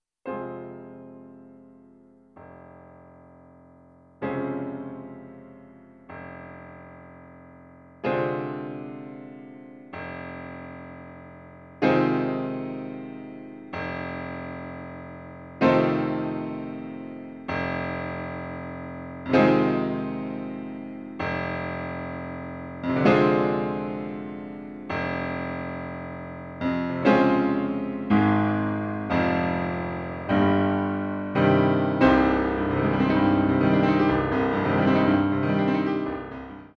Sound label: Piano Rachmaninoff